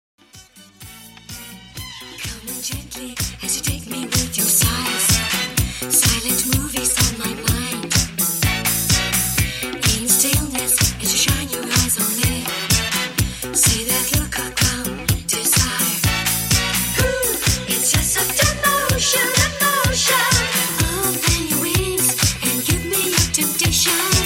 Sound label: soft emotion